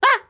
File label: Spa Hit